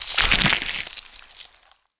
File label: gibbing